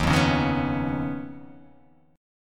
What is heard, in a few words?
D sharp 7th Suspended 2nd Sharp 5th D sharp; strummed; D#7sus2#5; x,6,3,6,3,6,3,x; JGuitar